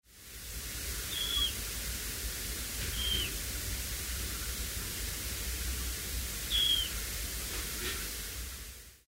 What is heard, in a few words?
dunlin nocmig